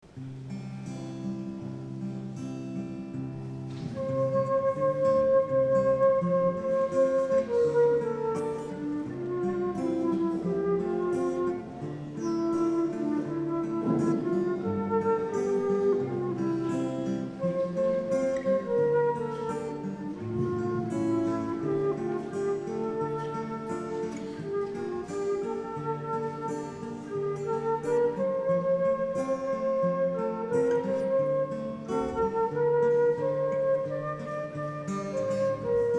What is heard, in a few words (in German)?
Einzug instrumental